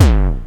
BD, Dance Mega Drums, BD HOU; Best Service

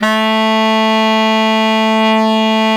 SAX A1, SAXOPHONE; USB Soundscan vol.63; Balkanish Traditions; AKAI

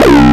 Sync C2; Digital Sound Factory Xtreme Lead

